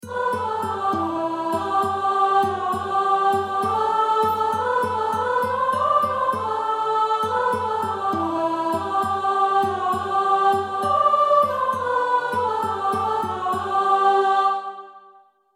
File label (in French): alleluia de noel